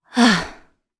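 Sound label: Vox Sigh, Japanese; Hilda; kingsraid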